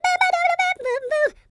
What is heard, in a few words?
SCAT, Jazz